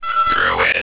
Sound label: blip; VitalWatch; druid